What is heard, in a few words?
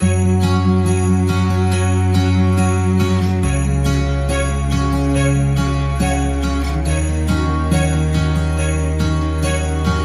Joyful Acoustic Christmas Soft